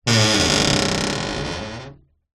household door creak heavy large antique

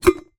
household kettle metal lid remove; zapsplat

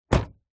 car door close volvo 850

